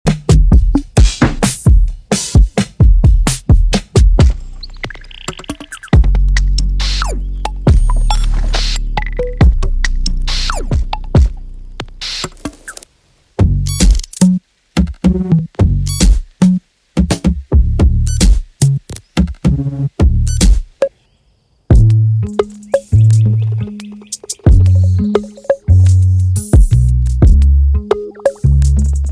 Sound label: DRUM LIBRARY; beatsbycryptic; MOSAIC